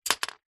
leisure game disc drop into grid tower game play single; connect 4